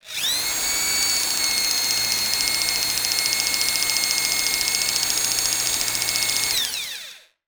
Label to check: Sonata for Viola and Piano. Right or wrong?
Wrong